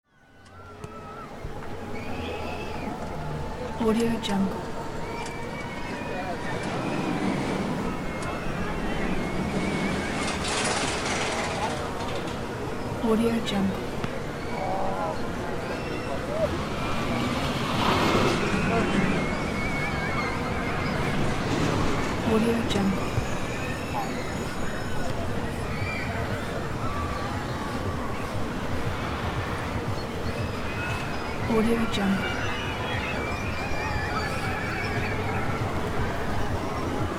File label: amusement park ambience